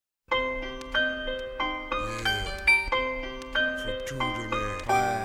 Rap Fr Intro

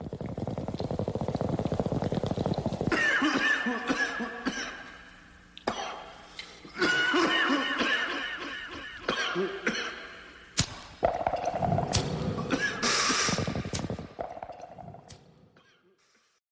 Bong

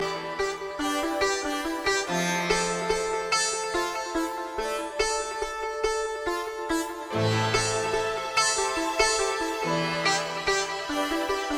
Nice Mandoline

